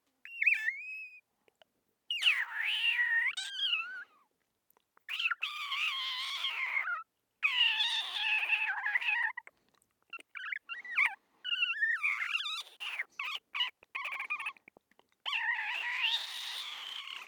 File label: Cute Animal Whining Vocalization